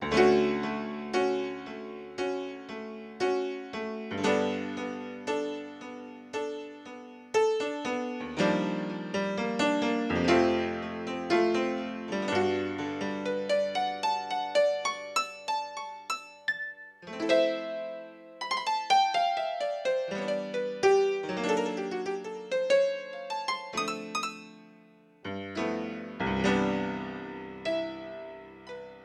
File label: Yamaha Grand Piano; Yamaha TX16W; Yamaha CFIII Grand Piano